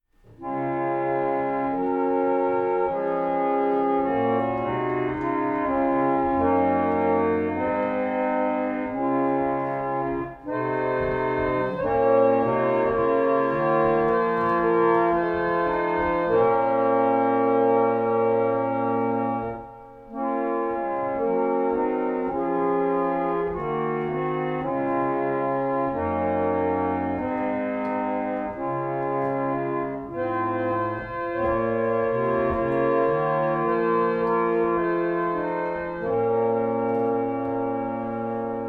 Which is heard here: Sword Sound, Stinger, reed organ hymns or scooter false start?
reed organ hymns